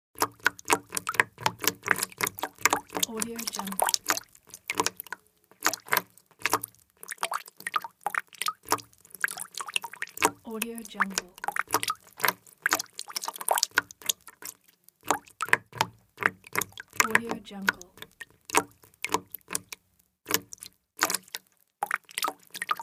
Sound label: water leak drips